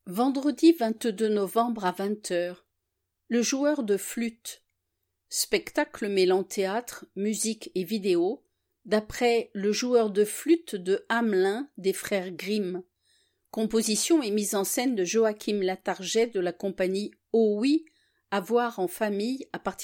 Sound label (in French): Le joueur de flute